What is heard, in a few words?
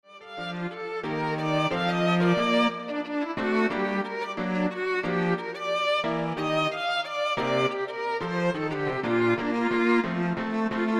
Piano Trio